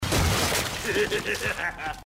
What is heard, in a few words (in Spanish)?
risa